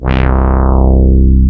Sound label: Ultimate Bass Root C; Vengeance Samples